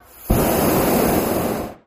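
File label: Hot Air Bllon Burn Hi, Hot Air Balloon Burner; Hiss, Burst On, Off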